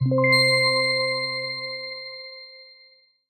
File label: ta-ding; Mirza Beig; The Last Particle